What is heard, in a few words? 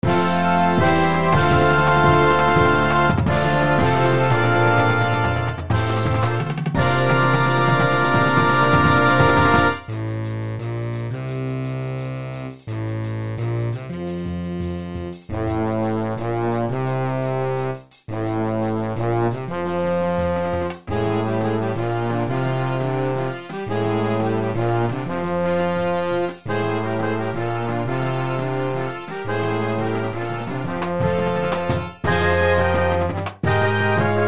Marching Band, Live